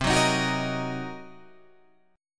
C Minor 7th Flat 5th Guitar Open G, chord

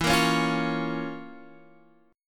F Major 7th Suspended 4th Sharp 5th E

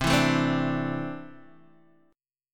C 6th Add 9th C down Guitar Open D

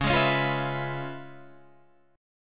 C sharp 7th Sharp 9th Guitar Standard, chord; strummed; C#7#9; JGuitar

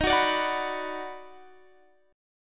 B Minor, Eb, chord; strummed

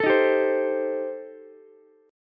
Eb 6th Flat 5th, Ukulele; Eb6b5 strummed; JGuitar

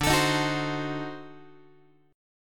D 7th Flat 9th D down Guitar; D7b9 strummed; JGuitar

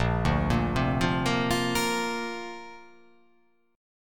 A sharp Major Add 9th

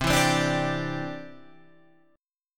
C-Major Add 9th

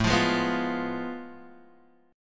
F Diminished A; Fdim/A strummed; JGuitar

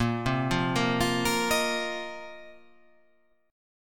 A sharp Suspended 2nd Suspended 4th down

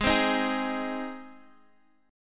G sharp Major, Guitar Open D; x,11,10,9,11,x

